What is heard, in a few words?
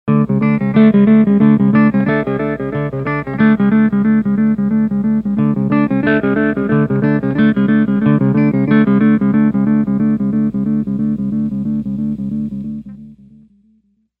Tap Two, toggle